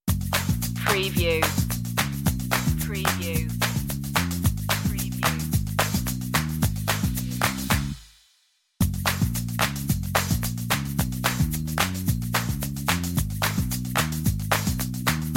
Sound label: island loop